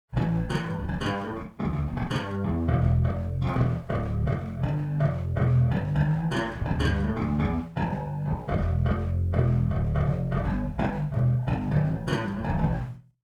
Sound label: bass wet